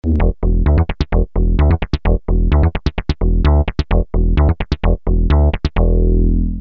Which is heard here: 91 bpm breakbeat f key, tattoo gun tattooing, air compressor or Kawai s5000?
Kawai s5000